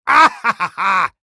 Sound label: male win; Enemys